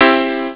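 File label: piano major; jazz jackrabbit 1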